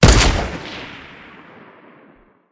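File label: machine gun, weapons; combat; Cosmic Rage; The Experience-light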